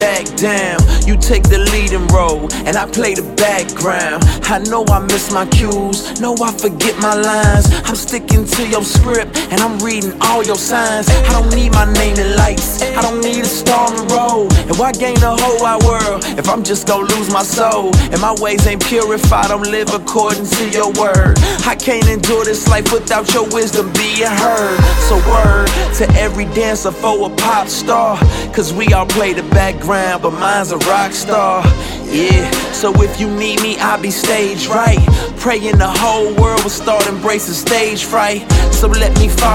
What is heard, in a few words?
Background